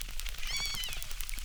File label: Trembling, acquarium